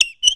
fur seal stand

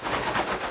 hill wind move

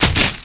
attack; archdam

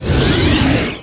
die

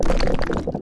die; volcaring

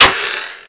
rafflesia attack

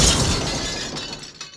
scaraba egg die

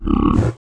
lava toad attack

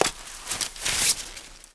equip item

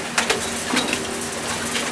machine loop

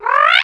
sedora die

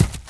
armed villager die

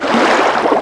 iceslug attack; melibe

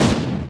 hells drive; hyper novice

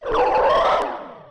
damage; amdarais

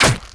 arrow

